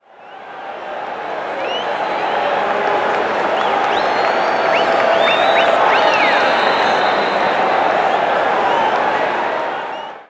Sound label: public; Mafie 1